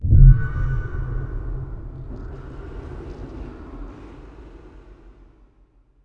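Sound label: blink ambience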